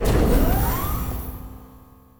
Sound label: start